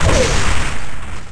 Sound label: shield break; federation